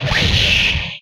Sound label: Explosion; MMRetroArcadeSoundsPack1 0 5